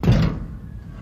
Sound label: Lever Lrg Mvmt Thud; levers